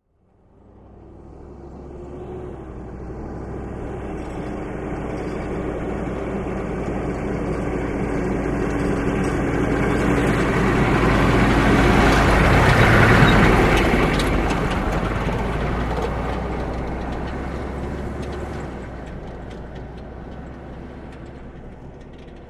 Humvee Slow By Rattle

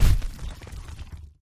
DEEP DEBRIS IMPACT, rock & sand

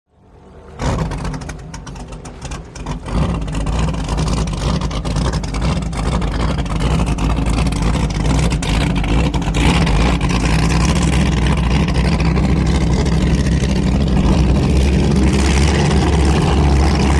Power Boats Amb Bird, off shore power boat

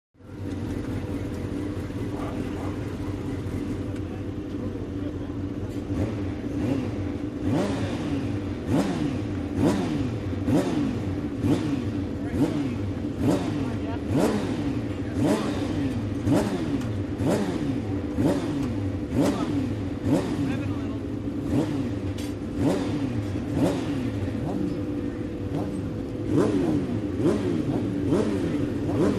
Motorcycle Race, road racing

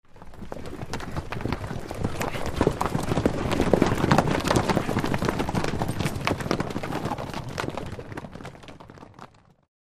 Horses Group Gallops

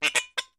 Cork Pops & Squeaks; Squeaks

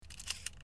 Camera Clicks Wind; shutters & movement

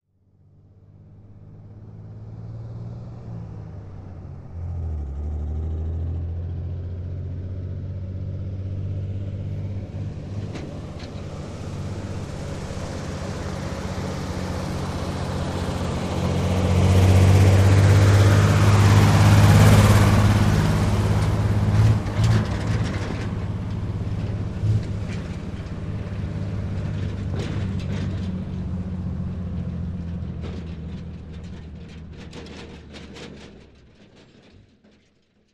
Truck 2.5 T Med By; 2.5 ton

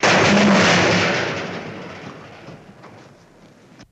Metal Crash Echo, crashes

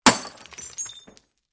Beer Bottle Break; Single, Heavy Glass Bottle Bre; bottles

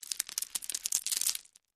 Landscape Rock On Con, rocks & dirt

